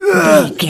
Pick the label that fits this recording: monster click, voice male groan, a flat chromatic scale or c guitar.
voice male groan